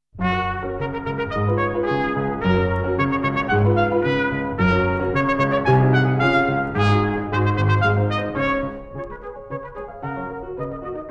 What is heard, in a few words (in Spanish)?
Trompeta